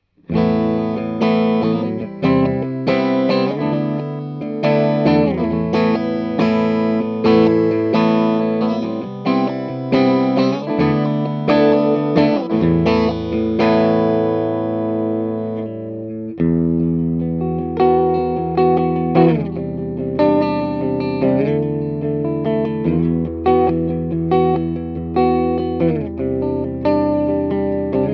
Vox MV50 Clean EQ Flat

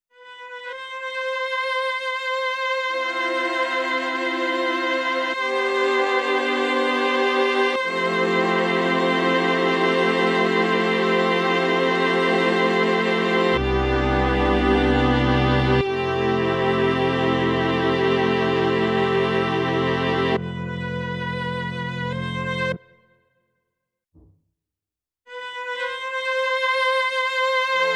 Strings

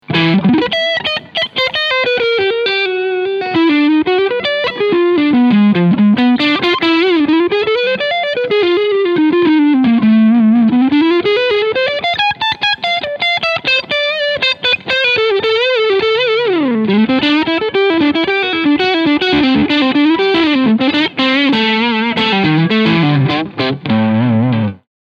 Strat Overdrive Sound Front Pickup; Fender Player Series

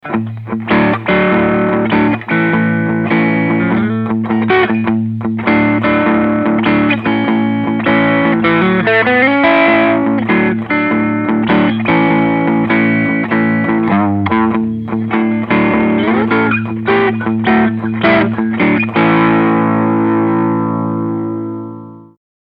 Medium Gain

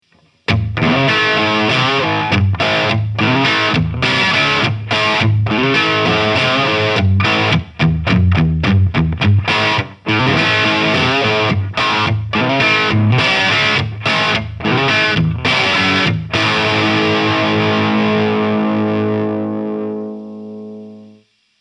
Harley Benton R 446 Blue Metallic Crunch

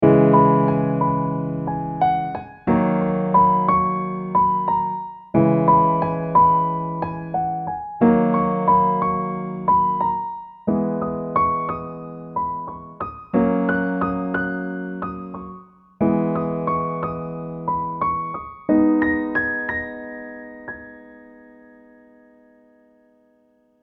Recital Grand

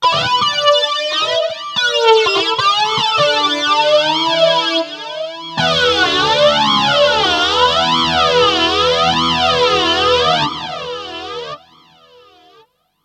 Flanger Lead Guitar

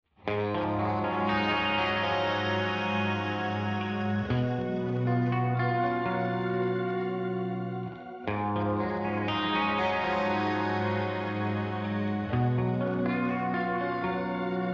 VintagePlate

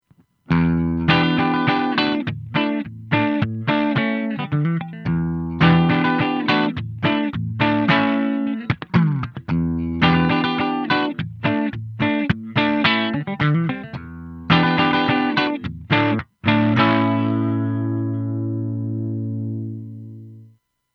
Guitar Preamp Clean; Two Notes ReVolt

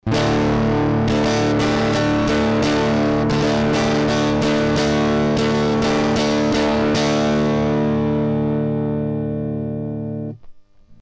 Behringer MIC300 gtr Gain max Out min

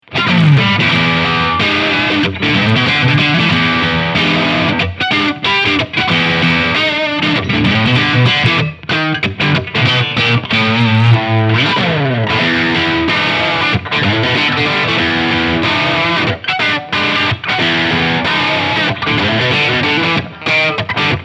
Single Coil Red Channel; Wampler Gearbox

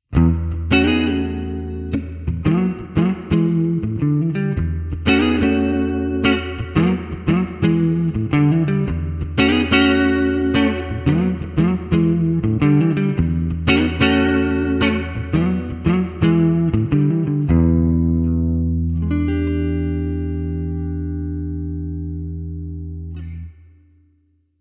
Amplitube Max Jazz Amp

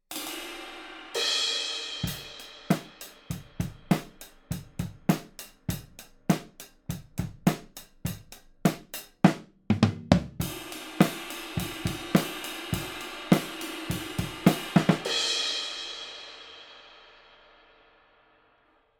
Warm Audio WA-67 Drums Front of Kit Cardioid

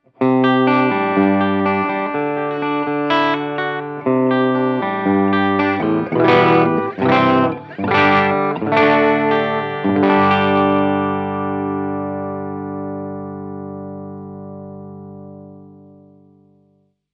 LTD Arrow Black Metal Clean

